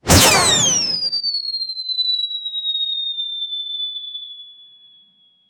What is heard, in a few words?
bullet ricochet near miss; whip crack